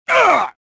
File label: pain; Star Wars Jedi Knight II Jedi Outcast; XBOX; bespincop